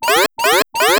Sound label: tap bom